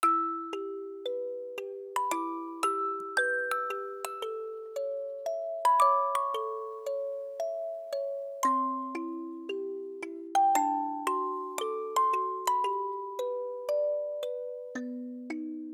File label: Music Box